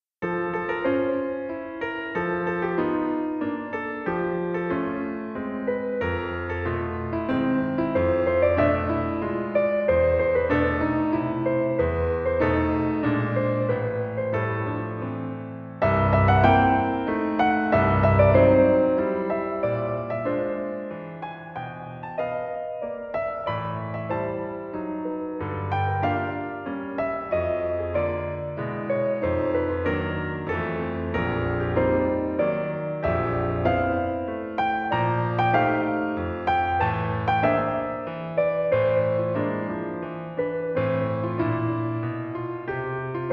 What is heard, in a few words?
Piano mazurka; Delius